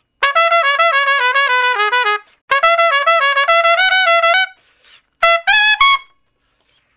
Trumpet Shuffle (Db); Solos

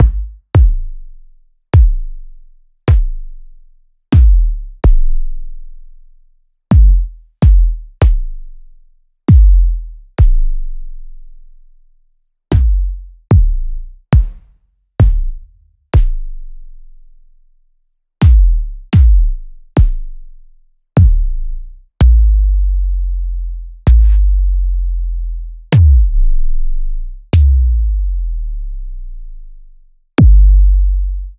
Kicks Synth Long; Ghosthack

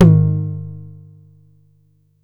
Bass Tom, Jomox Airbase99

